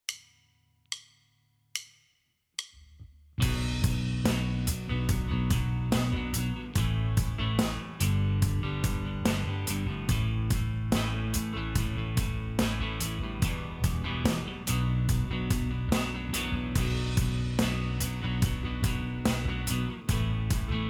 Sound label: Impro Ballade Rock